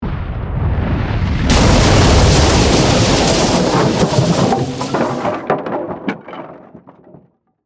Warfare, Cannon, Fire, Blast, Through, Ship, Crash; Bombs; BlastWave